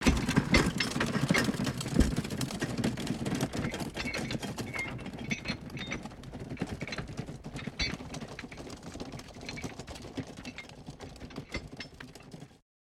Ui Env; Archeland

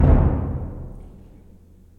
B Drum

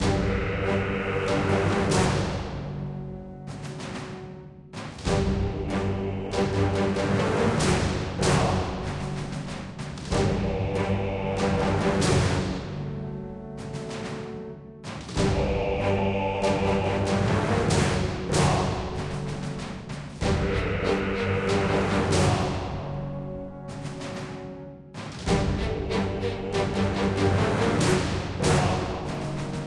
Load Out Bed

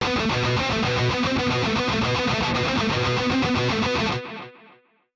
Guitar Solo; Timo

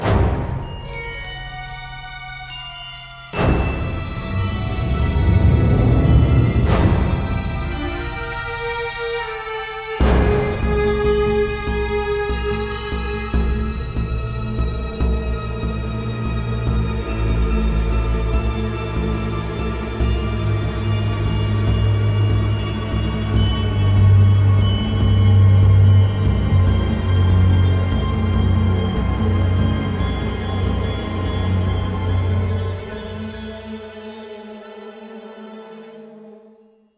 really scary prison; pirate mysteries android ios macos mobile windows gamerip 2012

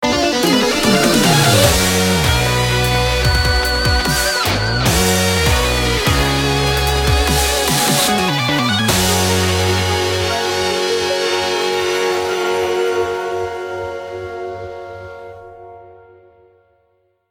Intro Stinger

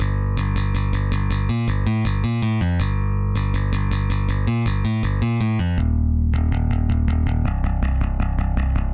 Drums and Jingles Explore BASS; Metal Tales Fury of the Guitar Gods

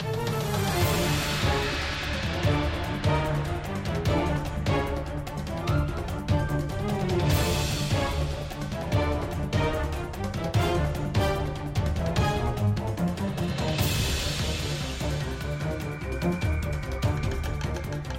battle boss; Gisou Shoujo to Kyokou no Machi; Android

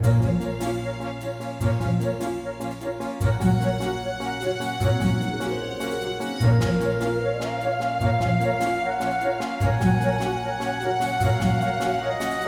credits loop; MacGuffin's Curse; iOS Linux macOS Switch Windows gamerip 2012

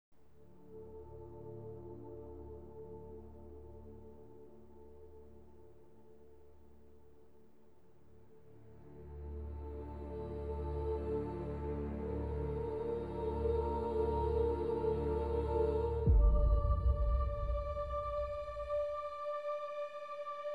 BGM Opening; The DioField Chronicle ps4 ps5 switch windows xbox one xbox series xs gamerip 2022

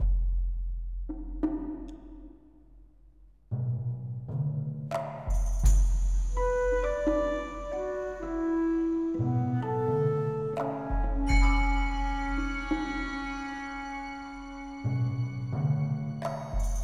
Russia Theme Percussion; Hitman GO Definitive Edition; PS Vita; HitmanGoDLC

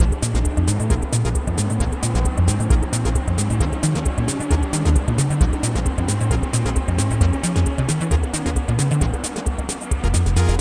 Space (Fast); Pocoyo Racing; wii

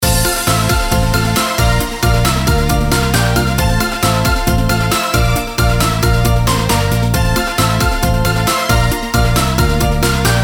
Raid; mist train girls android ios mobile gamerip 2020

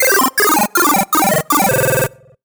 Solo Chip Square Negative; MUSIC EFFECT; startide

